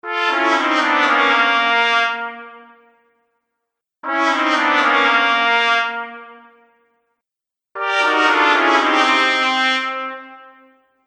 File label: Cartoon Failure Trumpet Collection; Cartoon Music Sound Effects